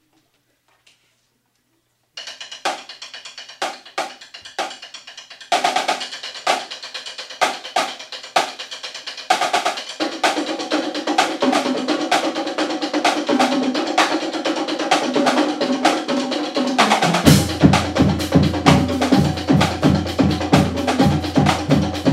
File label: drumline, marching band